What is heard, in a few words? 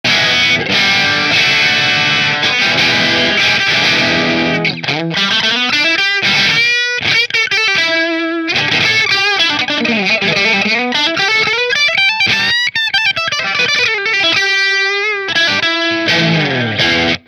Custom shop strat position 2 marshall, guitars